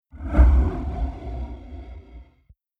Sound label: Whoosh Sample